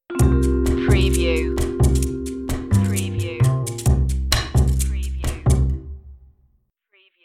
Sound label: jazzy opener stinger